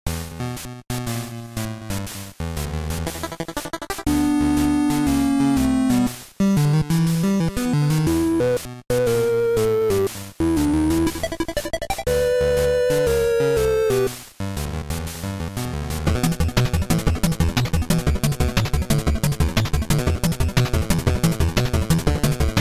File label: bgm park